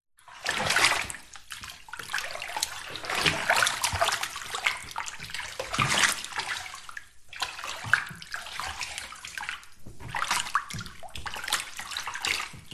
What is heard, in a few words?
household bathtub shallow water body movements room reverb; zapsplat